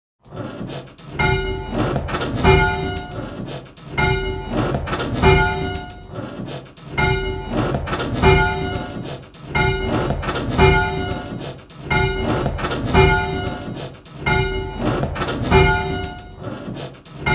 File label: bells, 6th; Just One Bell; Pebworth